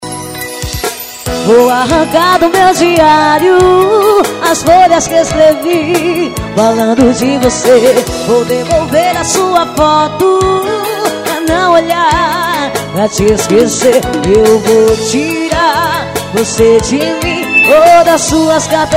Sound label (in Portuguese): diário brega das antigas